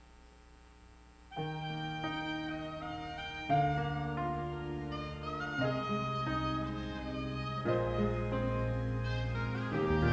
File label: choir